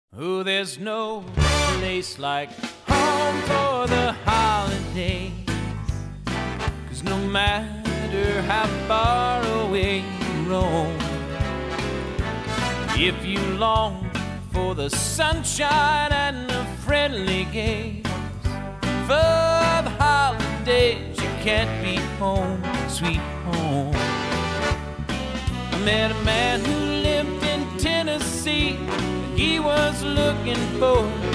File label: CHRISTMAS POP mono